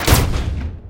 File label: attack